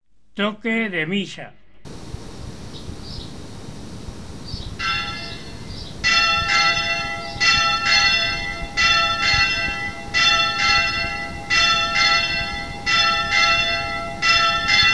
Toque de Misa Rezada, Campanas

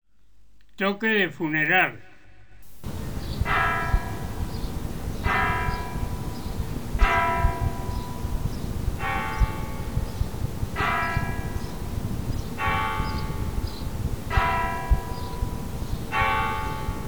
Toque de Funeral, Campanas

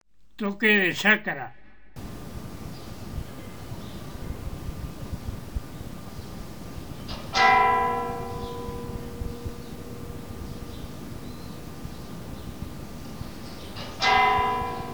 Toque de Sacra, Campanas

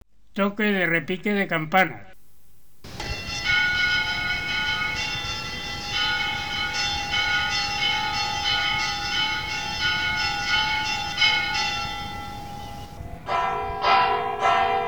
Toque de Repique y Repiquete, Campanas

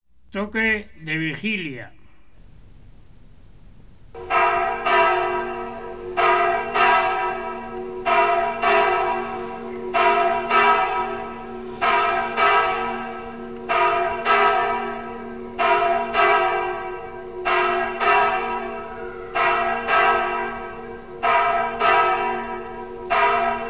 Toque de Vigilia, Campanas

